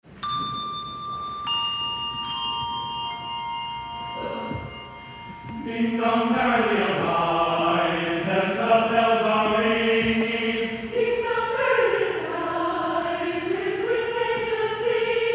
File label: choir; Ding Dong Merrily